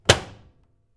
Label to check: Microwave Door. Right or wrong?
Right